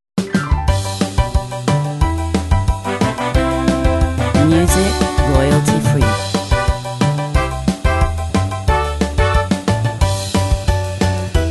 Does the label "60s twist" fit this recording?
Yes